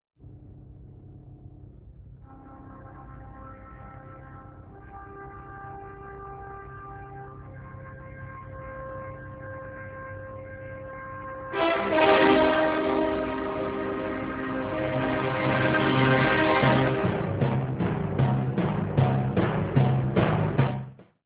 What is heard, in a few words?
Music G729